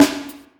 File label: snare, drums; virtual drum sounds; custom drums